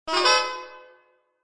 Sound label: saxophone blurp; Cartoon FX